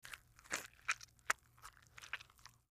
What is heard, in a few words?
MedicalScientific; Soundfx III